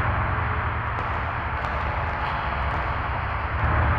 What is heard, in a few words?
sparse soundscape samples; ReLooped